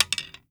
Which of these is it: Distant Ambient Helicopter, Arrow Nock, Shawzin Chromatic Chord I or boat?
Arrow Nock